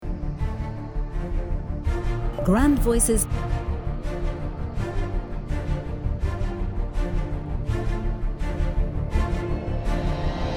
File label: MUSIC FILM